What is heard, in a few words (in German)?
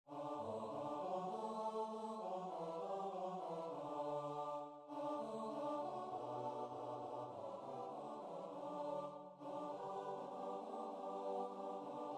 chor; Die freie Republik